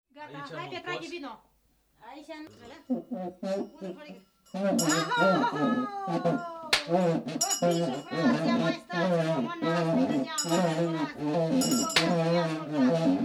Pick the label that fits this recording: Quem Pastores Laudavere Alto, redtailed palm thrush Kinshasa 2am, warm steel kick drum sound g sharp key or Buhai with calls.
Buhai with calls